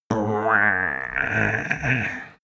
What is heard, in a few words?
male growl wake, koerpergeraeusche; soundpool